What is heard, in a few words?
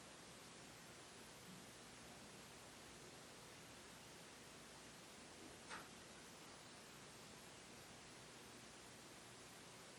Inside Tattoo Shop